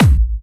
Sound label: Harder, kicks